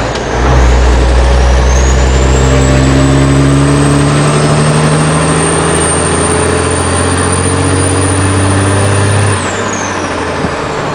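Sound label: third, car engine; tdmcars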